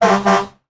Emergency Horn - Air Horn From Fire Truck Multi Quick; emergency effects; AdobeCC